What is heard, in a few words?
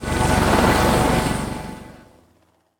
commando helicopter; smite; zhongkui